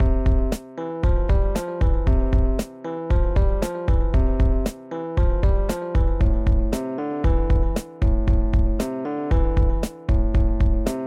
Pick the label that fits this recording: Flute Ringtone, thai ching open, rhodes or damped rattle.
rhodes